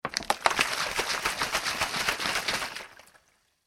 bottle plastic shake water, Bottle (Plastic) Shake With Water Inside; Plastic Bottle Being Shaken With Water Inside; Materials; foley; McKinney Sound